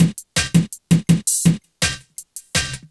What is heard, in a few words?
Place Break; Jungle; Breaks; Blu Mar Ten - Jungle Jungle - 1989 to 1999 Samplepack